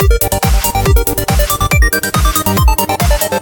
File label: Electro